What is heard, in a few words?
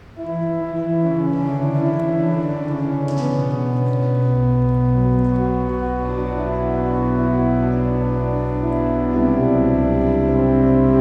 Organ interlude